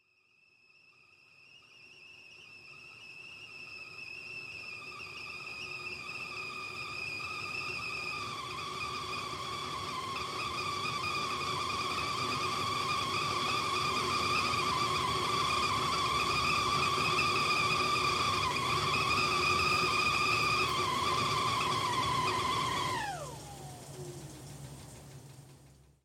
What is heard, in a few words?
kettle E3 Stereo